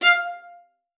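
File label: F5, viola spiccato; Xsample VOL-5; 90 s Sample CDs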